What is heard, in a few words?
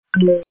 button